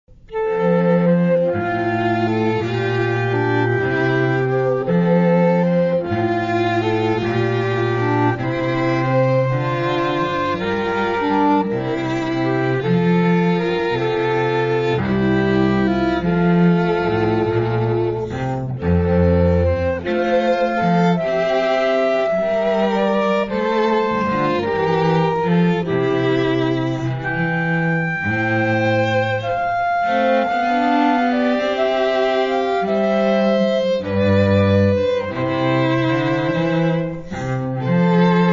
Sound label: Water Music Air; Händel: Water Music Suite, No.2 for orchestra in D major, HWV 349